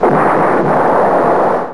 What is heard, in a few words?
explode; quake2; coop